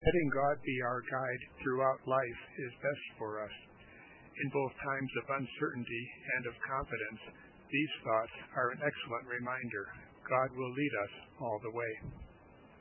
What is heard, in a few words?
leads; Requiem